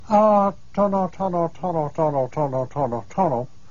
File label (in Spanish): tono short